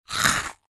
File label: eating